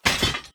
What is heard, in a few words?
hatch